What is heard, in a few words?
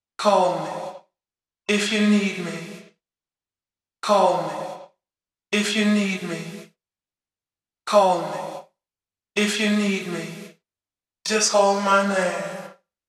Vocals; techhouse